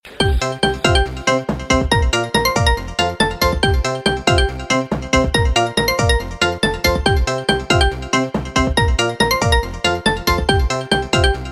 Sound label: Bell Beats Mix